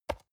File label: storage grab table soft, box; objekte